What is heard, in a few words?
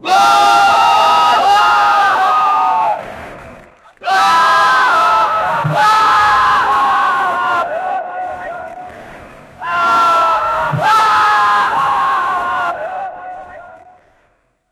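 ghostly screams and soldiers, battle cry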